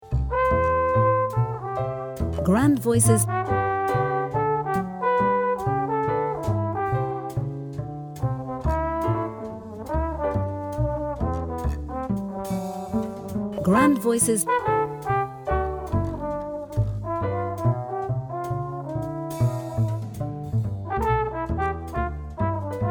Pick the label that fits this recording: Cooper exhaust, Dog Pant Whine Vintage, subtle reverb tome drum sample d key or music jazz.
music jazz